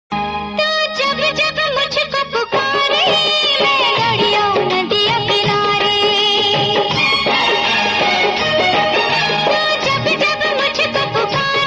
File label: ringtone hindi songs ringtone